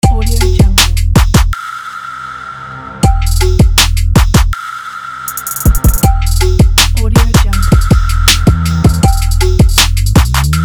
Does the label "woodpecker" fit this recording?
No